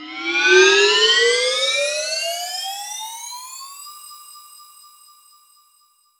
Agogo Noise; Roland BK-5

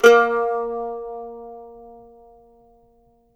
MANDO NOTES, A#3; Sampleheads; Whole Lotta Country 1 & 2

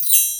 Bell Tree

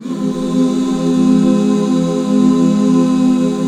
F MIN; Best Service Gigapack 1

